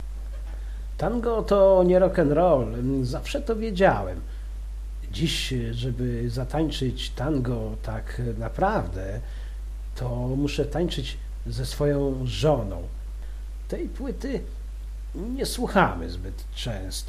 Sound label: Tango